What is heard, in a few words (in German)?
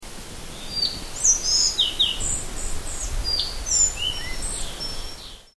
halsbandschnaepper